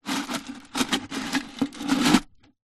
animals fish tank aquarium no water display log movement in gravel; zapsplat